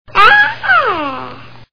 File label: Baby Cooing